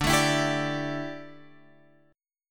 D Suspended 2nd Suspended 4th, Guitar, Open F, chord; strummed